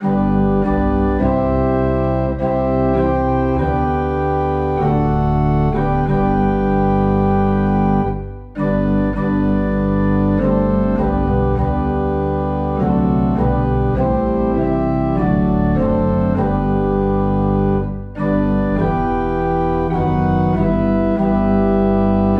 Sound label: Organ Low in G